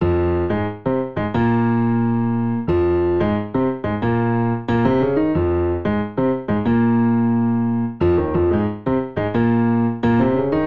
Ostinato Upbeat Latin RnB i - iv F Minor 90 bpm, Grand Piano; MIDI Clips; Ableton